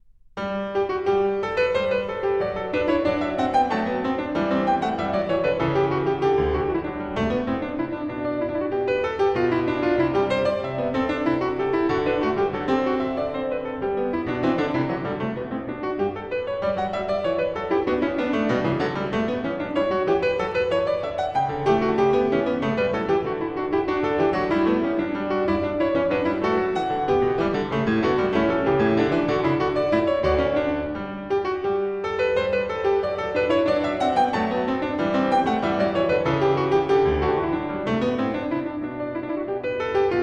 Bach Goldberg Variations BWV988 Variation 12 - Canone Alla Quarta In Moto Contrario